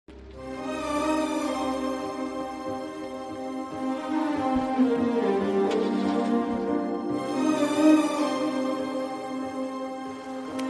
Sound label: interval scene bgm; majili